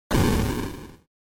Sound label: SNES RPG Defeat